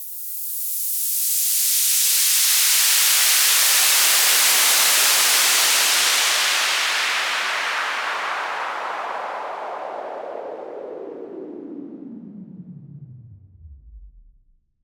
Fall Clean, Falls

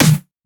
Snare; FLPRO

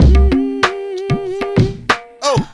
Loop

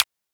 Transient; FLPRO

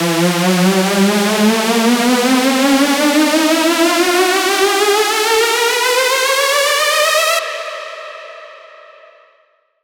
Saw E 4 Bars

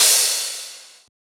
Crash

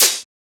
Open Hat; FLPRO

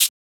Shaker

